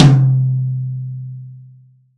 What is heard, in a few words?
Toms, Real